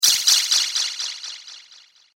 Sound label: Liquid Zap Decay, laser sound; science fiction sound effect; Andrew Fleming